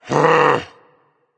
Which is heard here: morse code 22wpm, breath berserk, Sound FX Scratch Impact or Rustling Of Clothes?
breath berserk